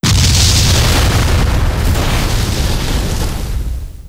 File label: Explosion; SpaceshipDemo